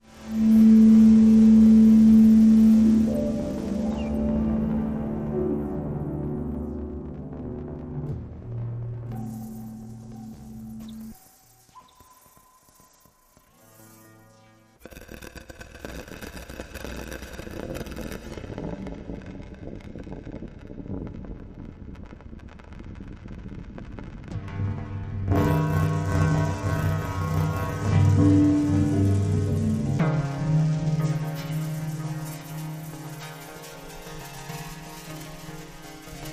Shifting States Musical Pulsers

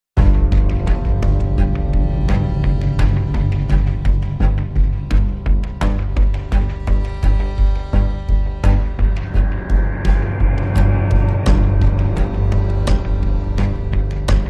Weight Of Darkness, Less Percussion

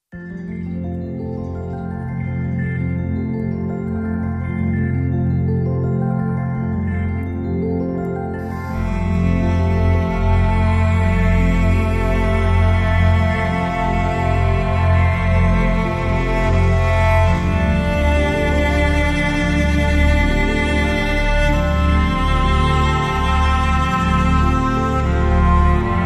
Ocean Cinematic, No Loop

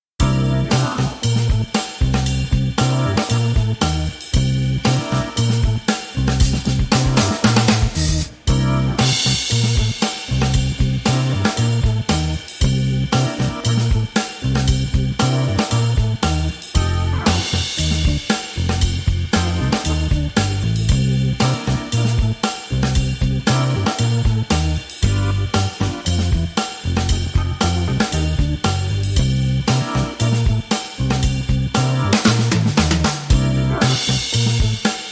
No Horns

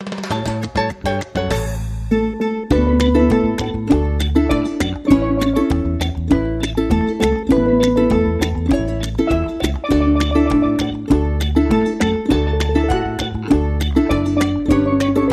Steel Drum Happy Birthday

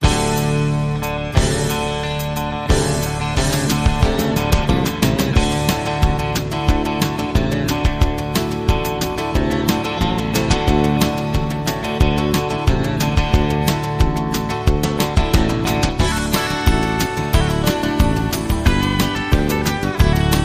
Flatbed Shuffle

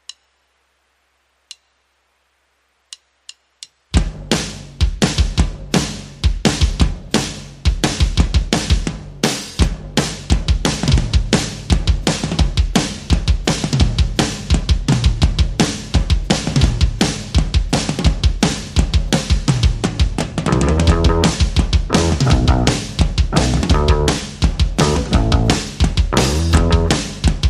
Drums and Bass Mix

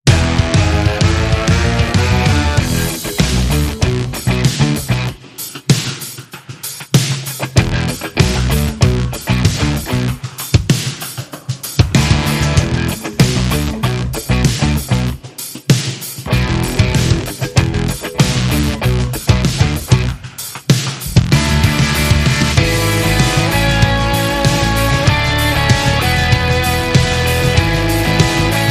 Rock

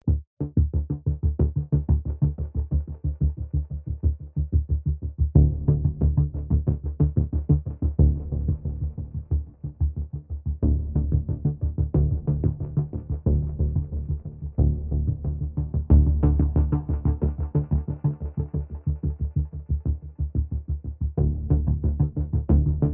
Pulsing Mix

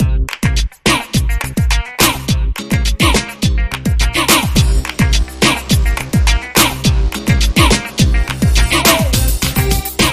Wedding Girls No Bells

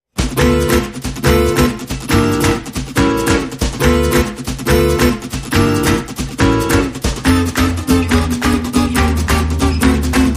No Crowd

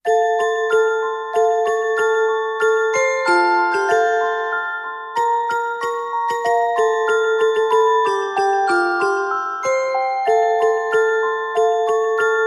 Jingle Bells, Carillon Version No Pad

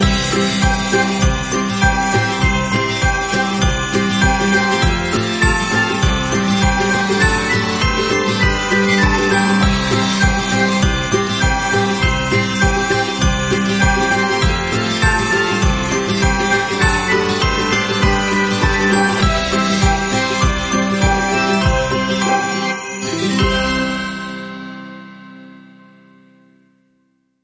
Happy Christmas

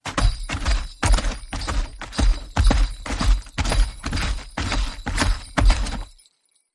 Sleigh Reindeer Steps; Santa

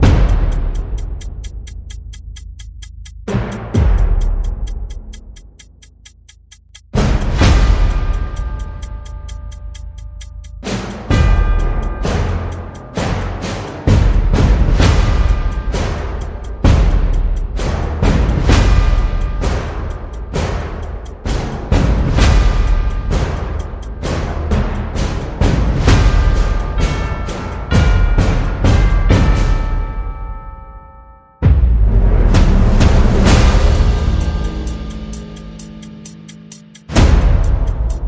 Percussion and FX

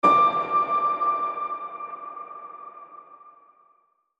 Hushed Light Piano Ping

